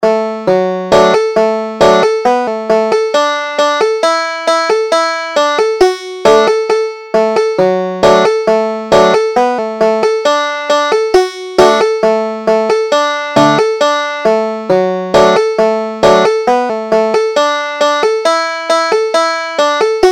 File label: few days, banjo